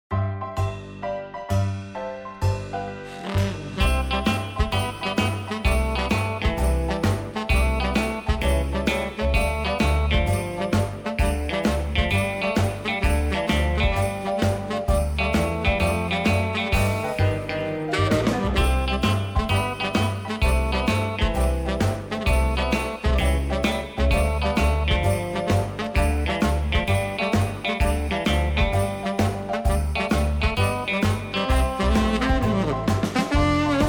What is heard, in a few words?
backing track